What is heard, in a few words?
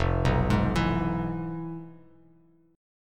F Minor Major 7th Flat 5th Bass Standard; FmM7b5 arpeggiated; JGuitar